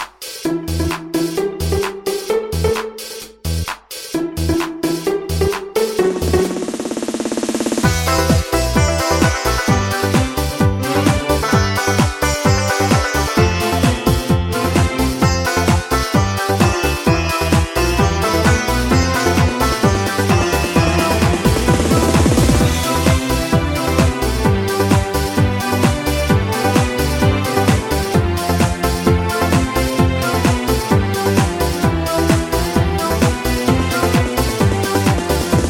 Flute

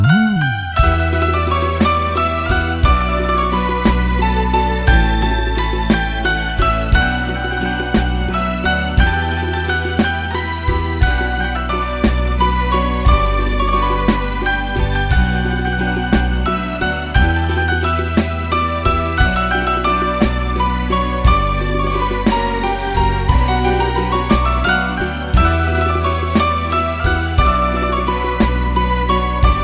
Melody

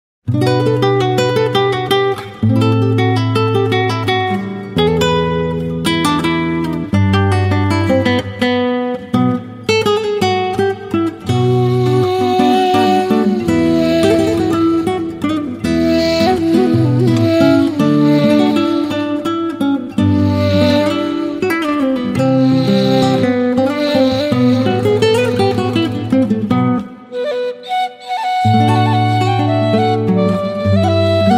Guitar Ney

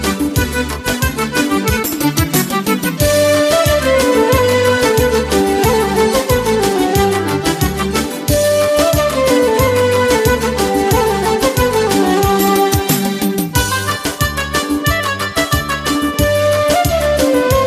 Flute

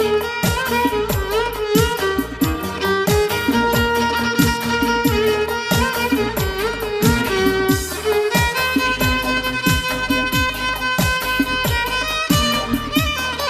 Violon

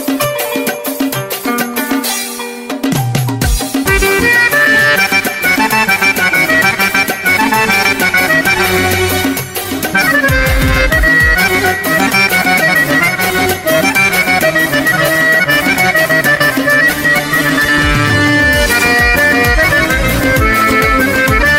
Accordion

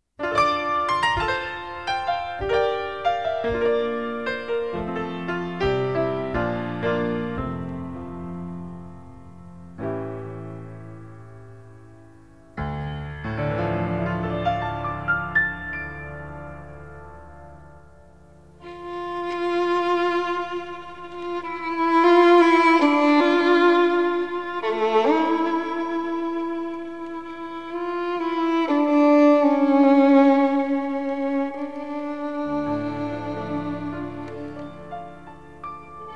Violin

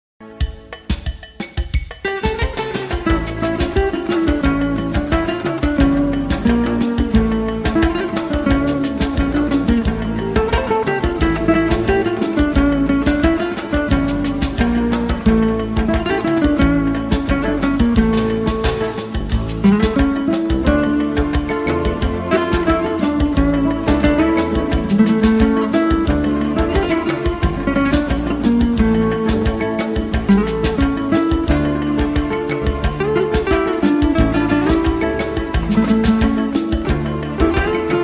Guitar

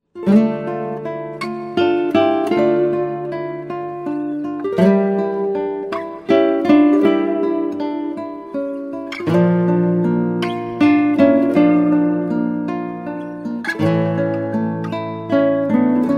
Guitar

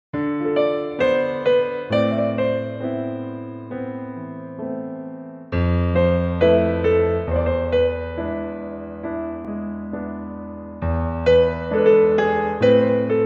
Piano

